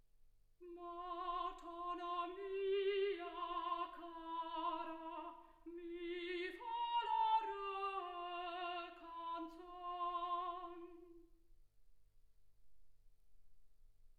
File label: soprano